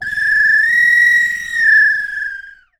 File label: bird call; Voice Crystal - Steve Reid Definitive Percussion